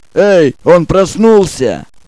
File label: woken